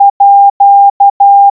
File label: wabun chouon